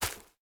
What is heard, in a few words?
grass, walking; factorio; Factorio 0.14.21